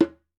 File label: WALK DRUM, African Drums